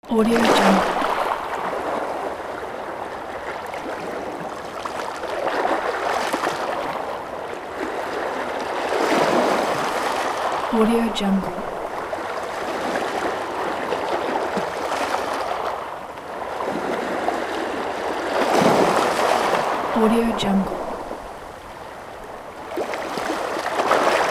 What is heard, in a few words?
ocean waves